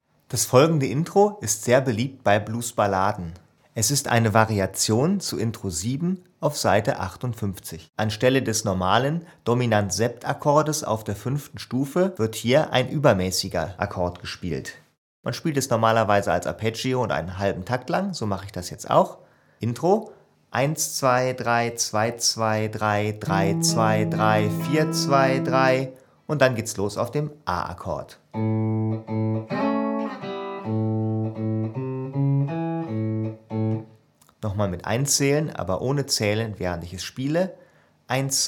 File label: Blues Intro, Bluesgitarre